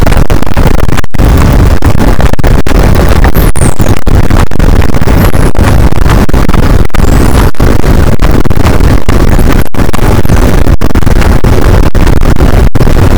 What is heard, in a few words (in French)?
cachalot positif, boat; Physeter Macrocephalus